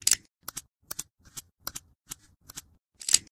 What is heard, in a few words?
Revolver Reload